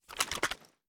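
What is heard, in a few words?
foley inspect; weapons; gmod; viper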